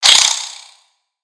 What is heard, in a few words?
Spider Get Hit; creatures mechanical; Lego